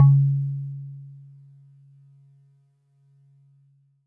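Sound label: Warble Celeste DX100 C#2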